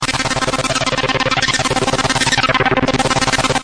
super strummer filtered, Synth

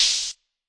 machine, electro hits; Hip Hop; style kits

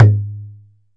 ROLAND SPD-20 PERC TABLA; ETHNIC and WORLD PERCUSSION

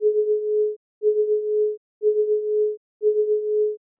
120 sine wave six eight; SYNTH AND ELECTRONIC LOOPS

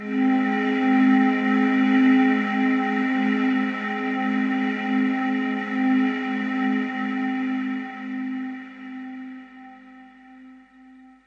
flute and noise pad; Pads

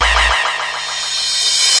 Electro FX; Ueb HE Electro FX

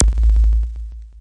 crackly kick, Electro FX; UNUSUAL KITS and FX; Atomhard Electro FX

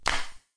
real verb real; clap; Claps

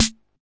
Roland RHY55, drum machine

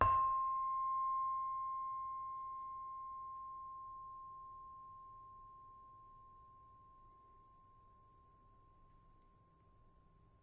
Saron, Indonesian; Multisampled; WORLD

